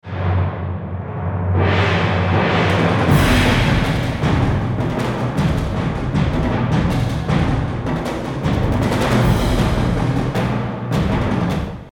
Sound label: tribal percussion